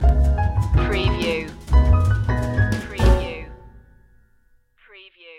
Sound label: jazz up stinger